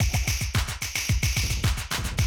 Classic DrumLoops, 110

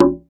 Dance Drums

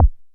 Drum, dry perc; Quasimidi Rave-o-lution 309

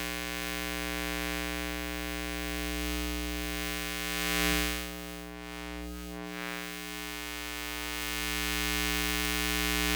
Electromagnetic Fields

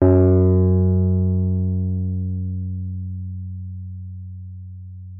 WURLITZER F#2; Xsample VOL-1; WURLITZER B

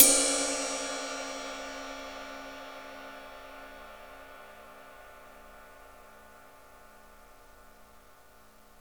cymbal

